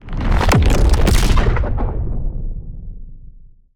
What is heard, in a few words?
tear space